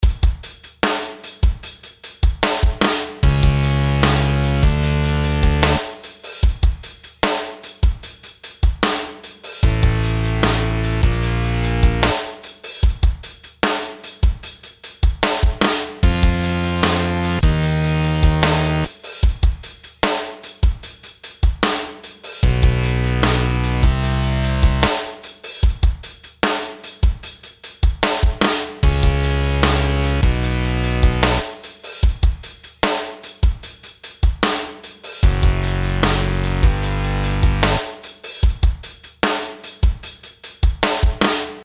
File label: intervals; m2-M2